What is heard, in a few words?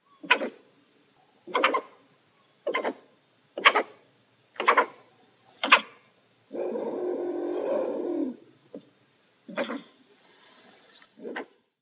stone marten; explorers